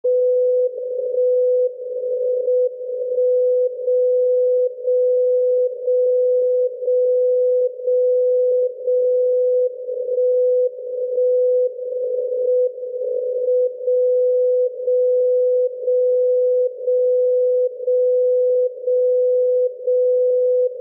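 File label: Fort Collins CO, radio; WWVB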